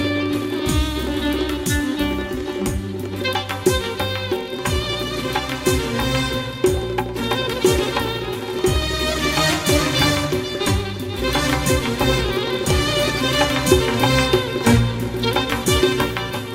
Violin

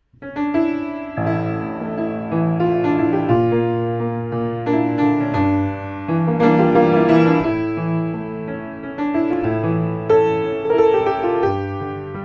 Piano

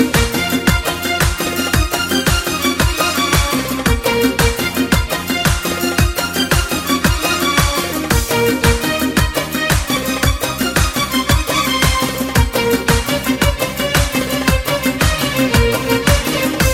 Accordion

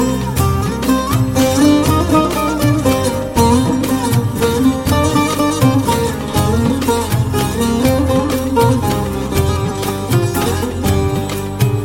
Melody

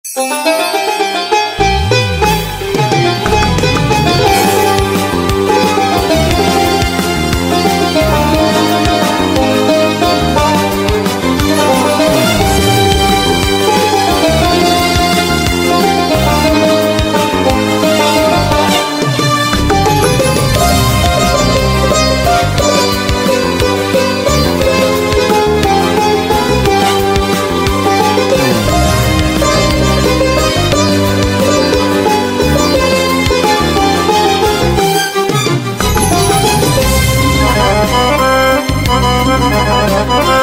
Accordion, play back

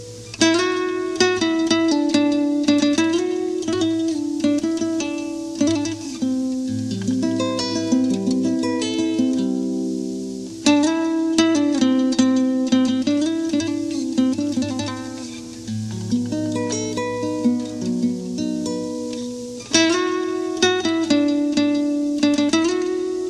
Guitar

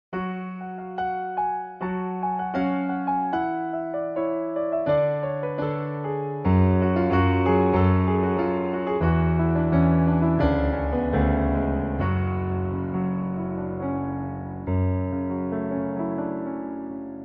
Piano

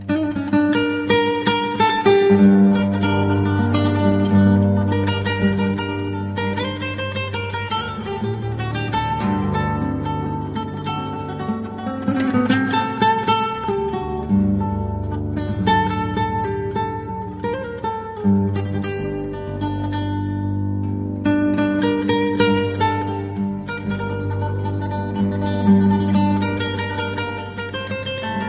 Guitar

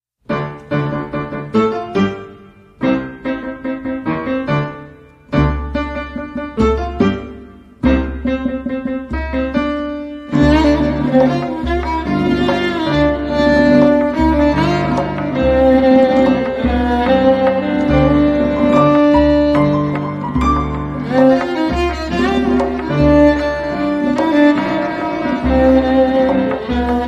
Violin

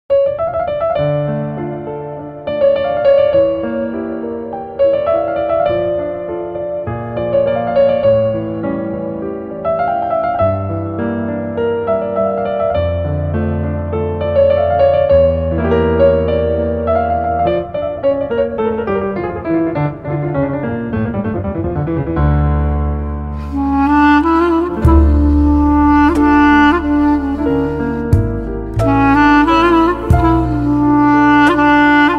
Melody

